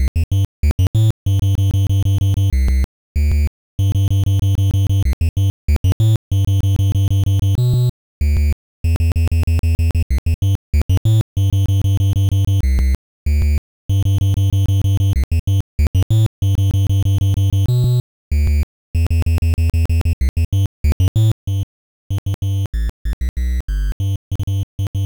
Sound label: triangle bass